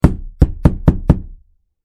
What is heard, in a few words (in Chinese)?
敲门